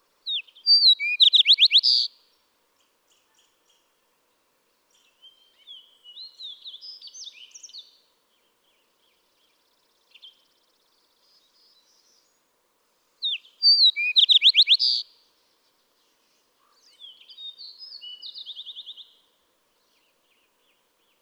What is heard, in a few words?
Fox Sparrow